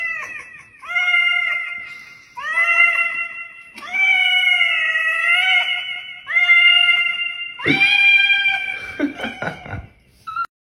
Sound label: cat with a mic